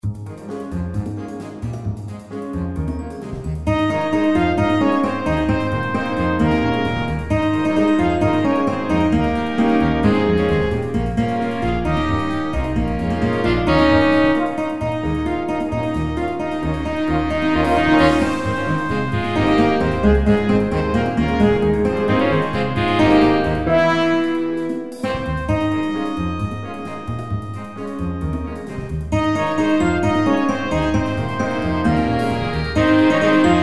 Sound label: jazzensemble aufnahme